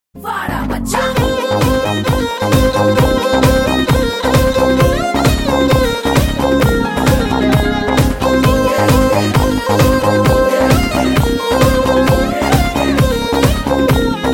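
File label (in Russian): заводной индийский звонок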